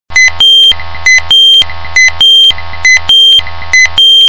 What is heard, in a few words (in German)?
Schleifenalarm